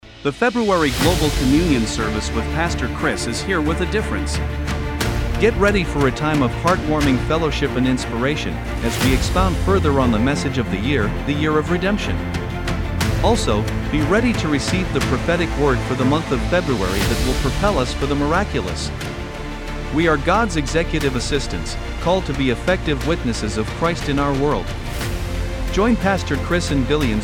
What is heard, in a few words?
JINGLE